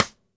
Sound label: DW Green Maple Cross Stick; Sonic Foundry (Sony Creative Software); Vital Drums